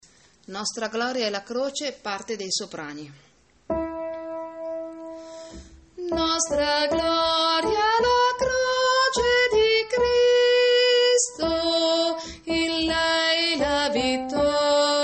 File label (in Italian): soprani; nostra Gloria La Croce